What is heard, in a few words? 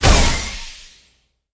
projectile; smite; tiamat